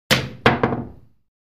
Billiards Ball Jump

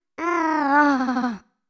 Female Robot Laughs, servos

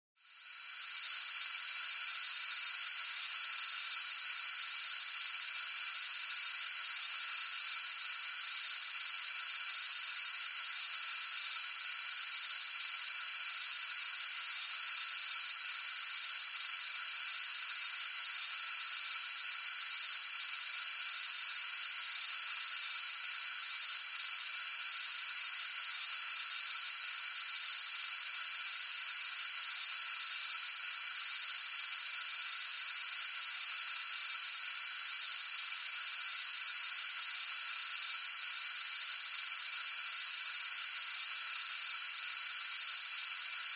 Gas Leak A Stdy Fuel L

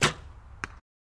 Archery Shoot Arrow

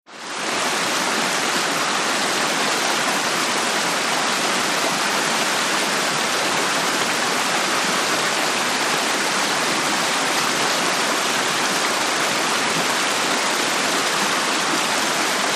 River Rush Med Dist Cl

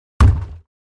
Large Bin Hit Sledge, plastic

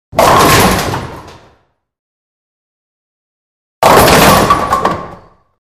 Bowling Pins; sports

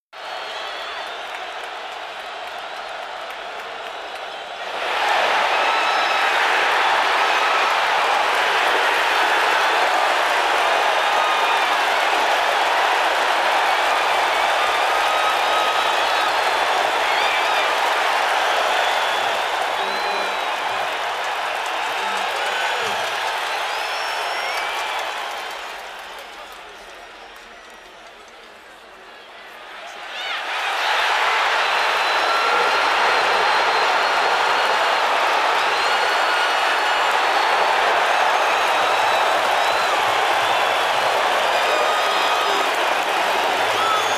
Football Crowd Huge; sports arena football

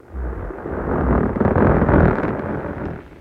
Earthquake Fissure E

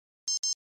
Device Turn On Beep; beeps

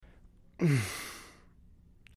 Breath Sigh Light; breathing

